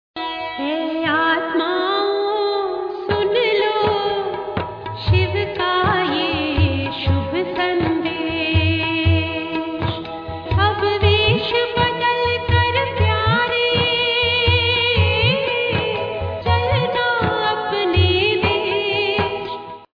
Meditation

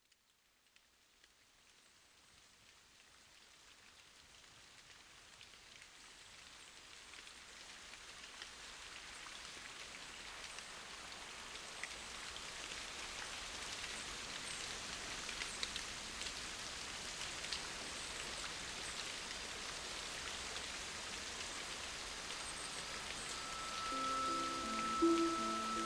Nature Music Birds

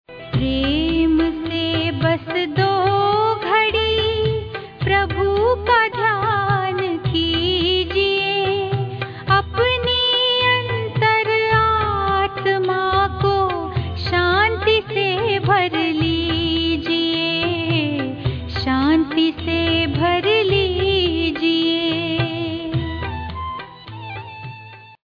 Prem Se Bas Do Ghadi (Fast)

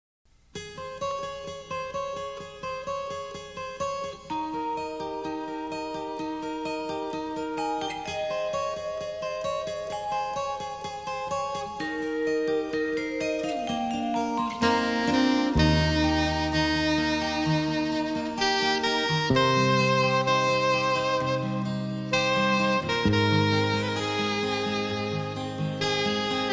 Instrumental Sailing

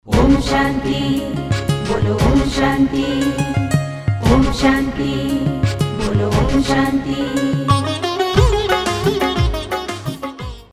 Om Shanti

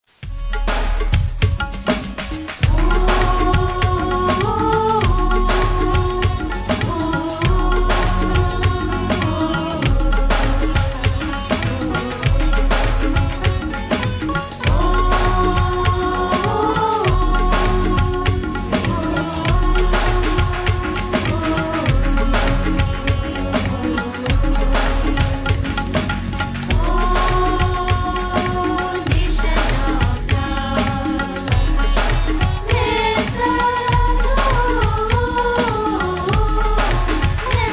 Classical Music; Fun Music

